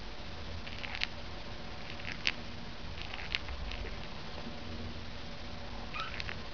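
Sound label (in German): schnabelknirschen, wellensittich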